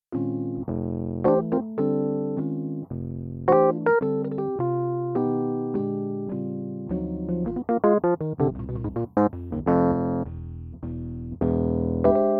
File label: stagepianos yamaha rhodes